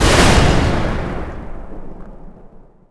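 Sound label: explode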